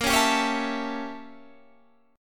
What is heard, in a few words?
A 7th A sharp; A7/A#; strummed